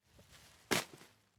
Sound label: plastic on cement